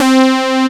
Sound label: C3 SAW LD, roland; roland1